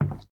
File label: Wood soft